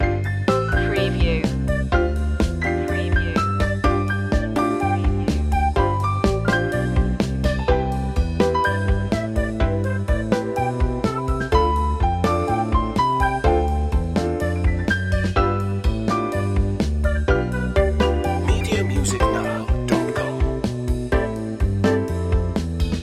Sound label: carefree day out loop